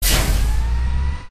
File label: motor start; silverback